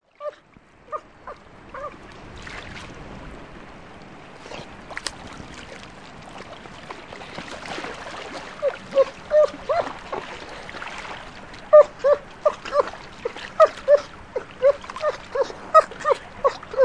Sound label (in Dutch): pelsrob